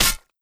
slash

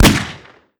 shot impulse

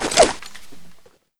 throw

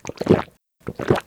drink

